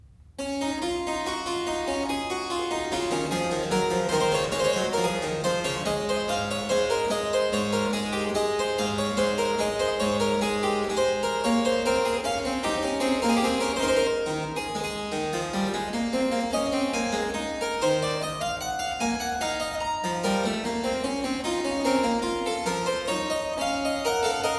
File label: Zweistimmige Inventionen in D major, Keyboard Works; BWV 774; J.S. Bach; Inventionen & Sinfonias